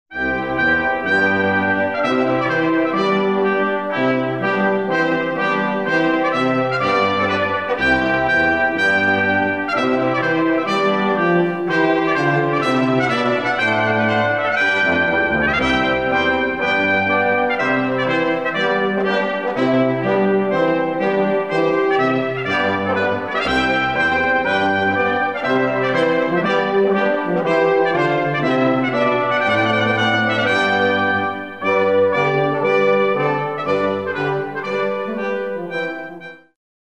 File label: TRUMPET TUNE